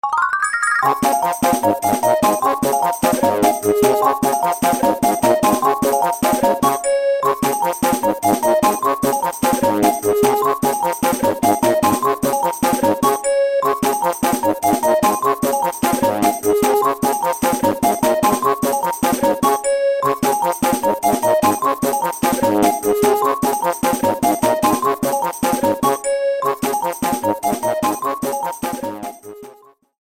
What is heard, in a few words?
Take the Coin